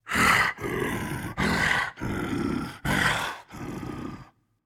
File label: breath, voicepack; smite; jorm